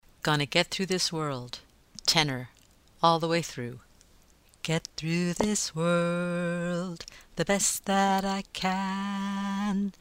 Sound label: Gonna Get tenor